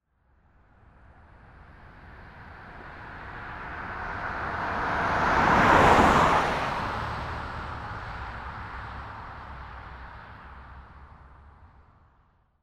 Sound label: Car Drive By